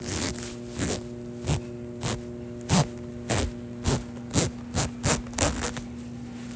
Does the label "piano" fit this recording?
No